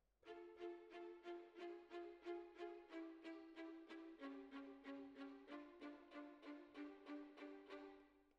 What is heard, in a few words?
Cellos